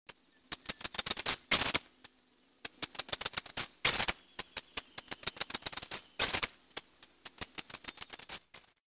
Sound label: kingbird